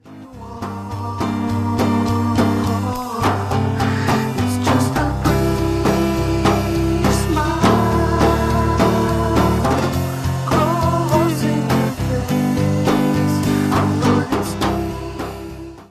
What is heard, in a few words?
chorus